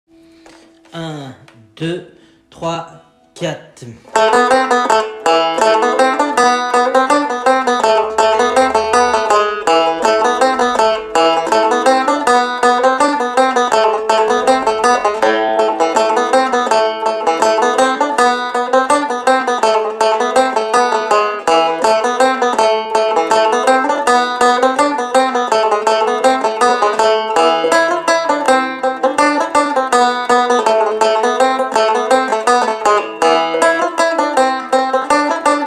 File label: scottish artense la mineur A minor bpm 82